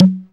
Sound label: Yamaha RX21 Click